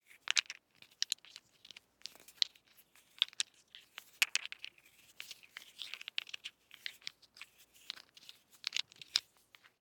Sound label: DICE in Hands, Manipulate, 3 Three Dice; Samples; ArticulatedSounds; DICE Sfx Party (Extended)